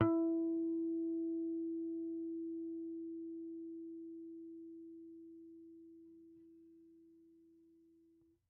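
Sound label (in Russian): чистые гармоники электрогитар E3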